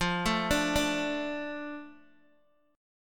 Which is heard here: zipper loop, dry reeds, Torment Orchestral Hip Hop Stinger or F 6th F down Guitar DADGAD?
F 6th F down Guitar DADGAD